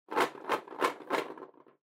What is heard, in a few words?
Drawing Box Shake